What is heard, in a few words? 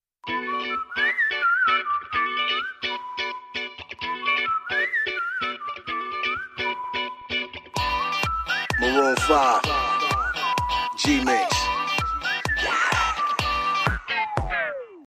win